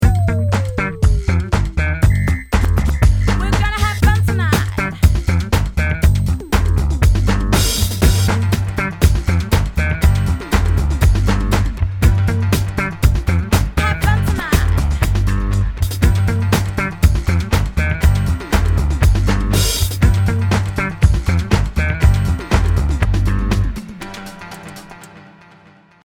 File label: disco funk